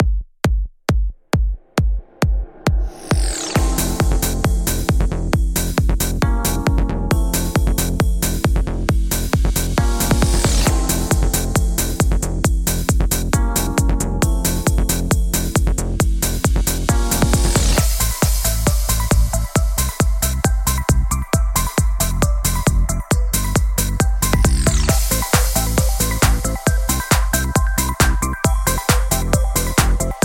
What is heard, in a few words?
Inst; fridaynightfunkin